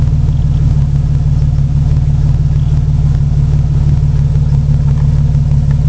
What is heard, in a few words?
muffler idle at 12in away, jeep